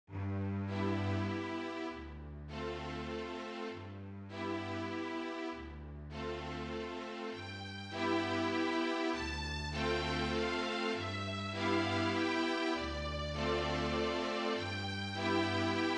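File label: fl cla string trio; MIDI Demo; Gymnopedie No.2; SANIBEL MUSIC PUBLICATIONS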